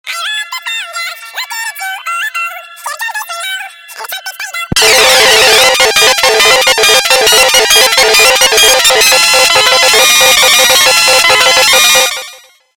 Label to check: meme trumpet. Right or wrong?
Right